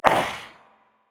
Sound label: Assassin Grunt Fast; Realm Royale; Chained One